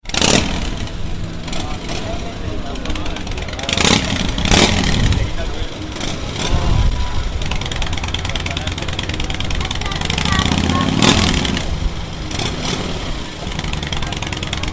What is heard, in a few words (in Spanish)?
probando filtro harley; bcn harley days 2010